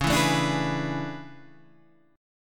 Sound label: A Major 11th, guitar